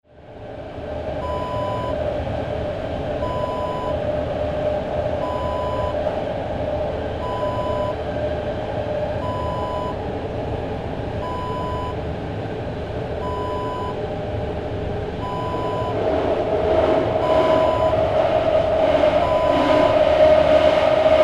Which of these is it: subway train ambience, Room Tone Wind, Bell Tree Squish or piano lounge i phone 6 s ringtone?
subway train ambience